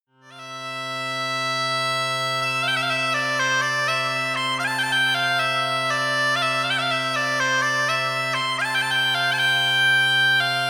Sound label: bagpipe solo